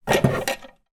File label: household clock small pick up from table